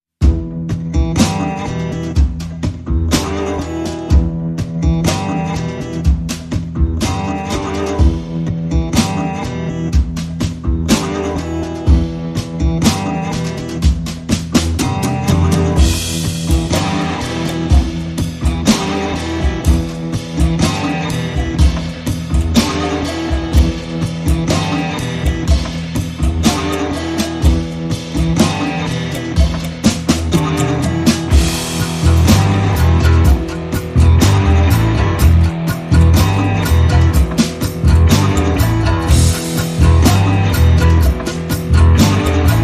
No Lead Guitar

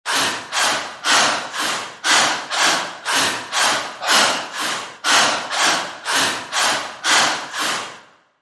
Inhale Exhale Pulse

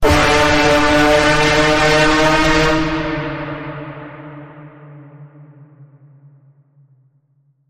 Bend, D

